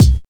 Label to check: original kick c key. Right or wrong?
Right